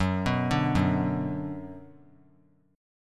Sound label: F sharp Suspended 4th Sharp 5th chord; arpeggiated; F#sus4#5